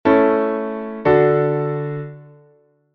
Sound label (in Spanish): autentica re M, Cadencia Auténtica